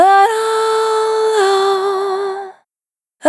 Vapor Dry, Single Voice Melodic Loops; Pocket Diva World Class Vocal Samples

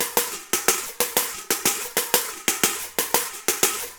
reco-reco, DRUMS SAMBA; Best Service ProSamples vol.14; AKAI

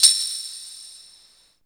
VRB TAMB; East West - Phat & Phunky CD2; 90's Sample CDs

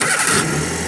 Car Start; mt-32

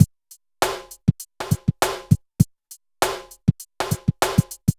BPM100 SYN

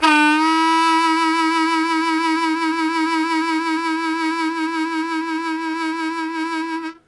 Strong Vib w Scoop E1, Harmonica; Zero G

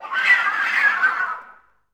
FL FX, Advanced Orchestra; Best Service; Peter Siedlaczek's Advanced Orchestra

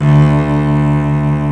ARCO CELLO, Solo String; SoloStringCombo

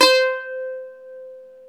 SAZ, Plucks; Eth Plucks, Pluck Duo

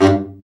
BRA BARI, Bariton Sax

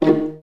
VA PIZZ D#2; Best Service; Peter Siedlaczek's Advanced Orchestra

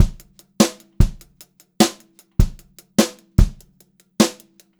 JZ BEAT, Brushed Drum Loops; JAZZY; USB Soundscan vol.39; AKAI

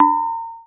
Vermona DRM1

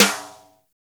STICK, Drum 1 Dry; East Collexion

